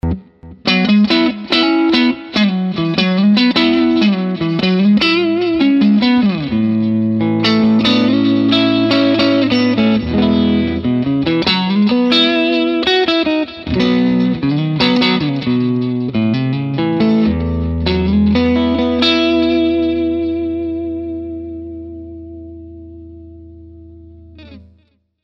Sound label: el84 with strat verb